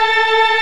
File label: Organ, Barton+MortonMel